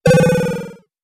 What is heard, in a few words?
miss